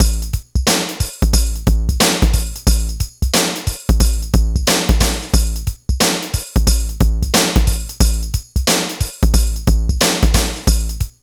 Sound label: hiphop; INIS; Funky Xtreams